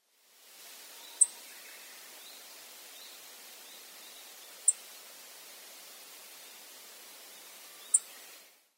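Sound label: chestnut bunting, sakata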